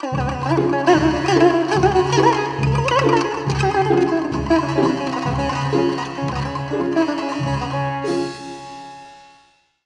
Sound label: Bari Sax